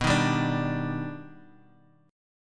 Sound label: D sharp Augmented Major 7th B